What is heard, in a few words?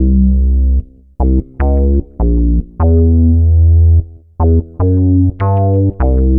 Punch Bass